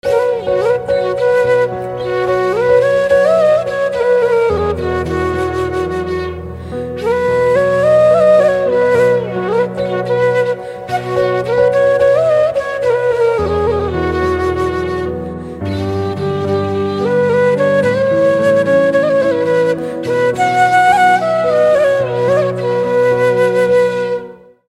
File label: Flute Instrumental Ringtone